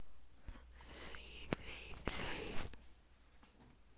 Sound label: sweep